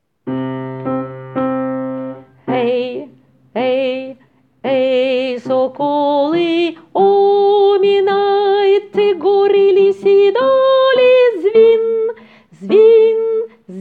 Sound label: He sokoly Hommes